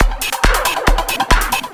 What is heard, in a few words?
percussion loops, Techno; PS-45 WAV Techno ID